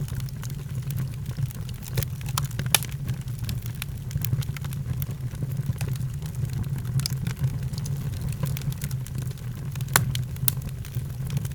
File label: env fire; ott3d